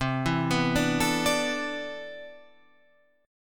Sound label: C Major 9th Add 13th, guitar chord